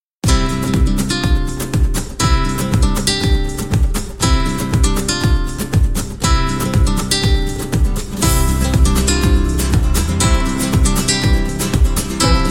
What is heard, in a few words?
Rumba; Onagawa